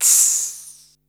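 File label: hi-hat human open; 300 Drum Machines; Yamaha Motif ES-Series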